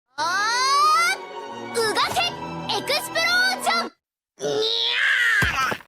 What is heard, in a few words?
nyaaaaa; megumin